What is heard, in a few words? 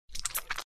Suck; Lewd SFX